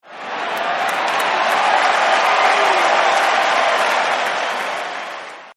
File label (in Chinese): 大欢呼; 战中; 战斗音效; nba